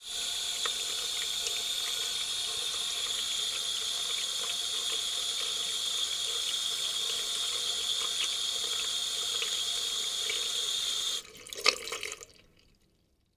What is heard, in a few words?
sink; liquid; NS Studios - ultra sound collection